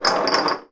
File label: foley beer bottles slide across worktop